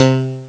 pull bass; Amiga Soundtracker Sample Packs

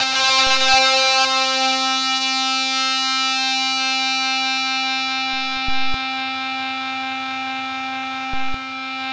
ZWEEP; Spectrasonic Distorted Reality 1

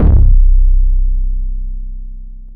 C BASS; Zero-G; Chemical Beats; BASSES

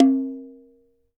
CLAY, CAMEROON; AKAI S6000 CD-ROM - WorldSounds; CAMEROON PRC

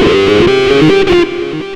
xtra lead

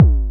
Short, Kick, Traditional; Multi-Kick; Long

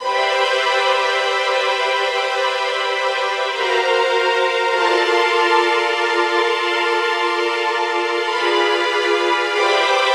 String; Sonic Foundry (Sony Creative Software); Infinite Soul VOL-2

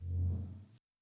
TM Revrs, Tom; Yamaha DTXpress-II

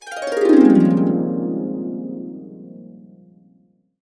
AB MAJ, Harps; USB Soundscan vol.62; Complete Harps; AKAI

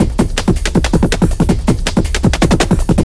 JUNGL 160, JUNGLE LOOP

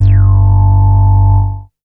MOOG BASS G2; Best Service Dream Experience

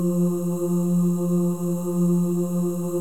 OH, FEM, Symphony of Voices; Spectrasonics

